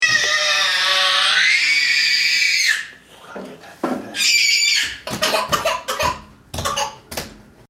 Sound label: whining 18 month old